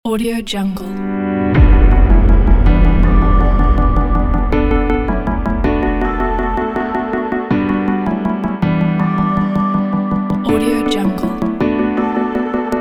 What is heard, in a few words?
energy future bass